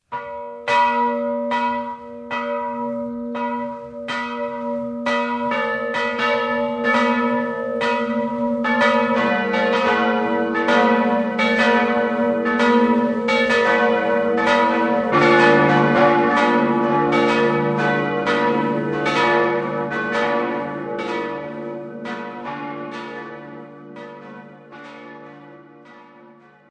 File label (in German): glocken